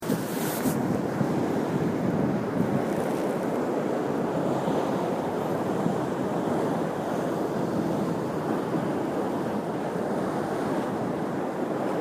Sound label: Electrified Environment